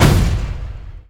wall; CosmicRage

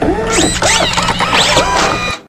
repair; ships; ratika; CosmicRage

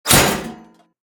metal, combat, weapons, swingable; default swingable

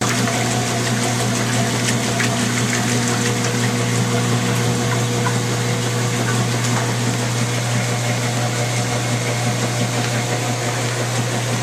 washing; CosmicRage

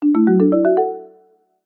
bingo board

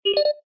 announce, ships computer

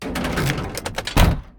charge; combat; battlesuit

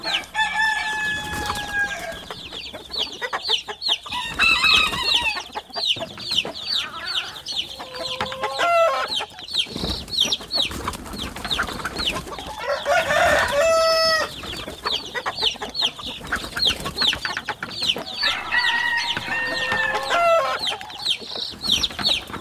chickens; farming; CosmicRage

